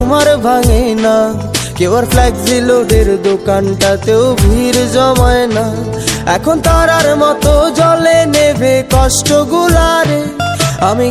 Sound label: bangla ringtone